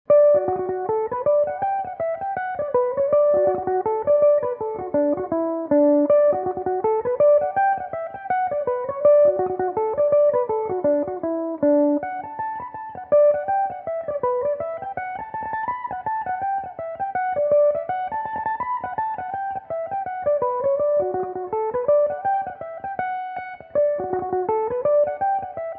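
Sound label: D major, Irish mandolin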